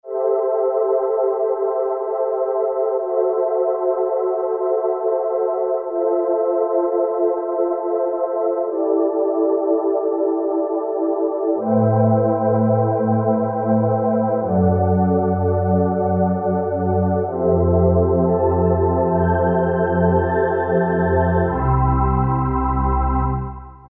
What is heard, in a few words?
syn Matrix Pad, E-mu PK-6